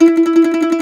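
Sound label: MANDOLIN TREMOLO, Plucked Strings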